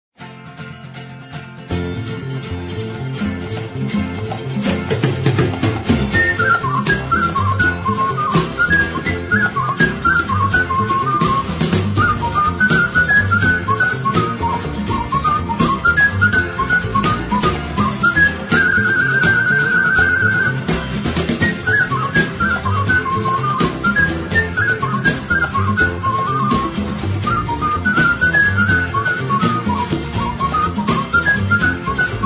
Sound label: Mexican Whistler